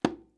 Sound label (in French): Congas doigts